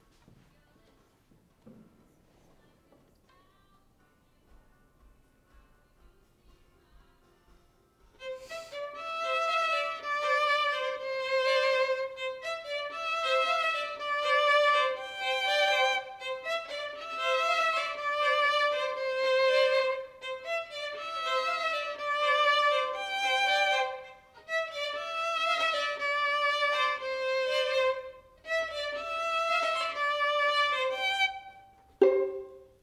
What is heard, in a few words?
violin